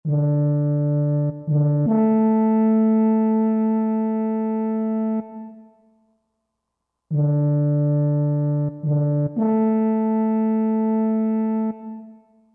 French Horn, Roland JD-990; Factory Patches

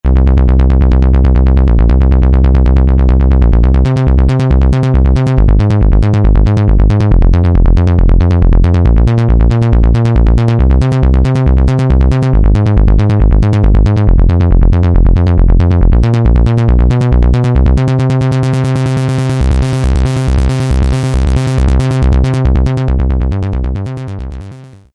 Acid Saw Bass, Korg microKORG; Factory Voices

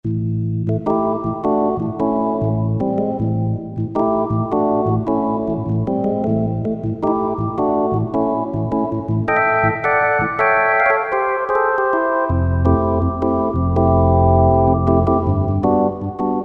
3 Bar Direct, Rock Keyboards; Greytsounds Philip Wolfe's Rock Keyboards

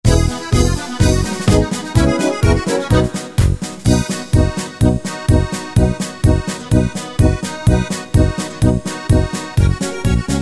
Polka, Yamaha QY70; Preset Styles

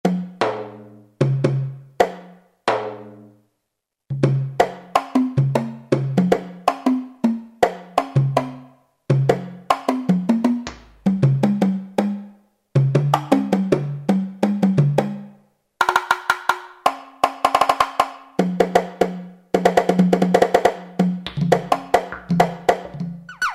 Dyn Percussion, Kurzweil K2000; Kurzweil K2000 V3

